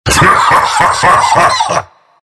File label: morph laugh; Terrorblade